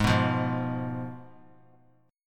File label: Ab Minor 6th Ab, down, 3C, 3F, 3A, 3D; Abm6 strummed; JGuitar